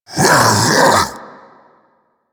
Infested Splitter Vocal Pain; Warframe; Grey Strain

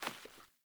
Sandals Walk Vegetation, Footsteps

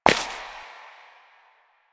Step Ice; Warframe; Koumei

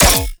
Full Draw Mech; Warframe; Infested; Derelict Cernos Prime

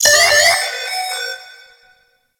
Camera Drone Alarm; Warframe; Grineer Camera Drone

